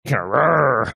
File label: growling